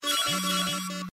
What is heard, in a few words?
Attack Special Alarm; The Powerpuff Girls - Mojo Mayhem; NextlevelGames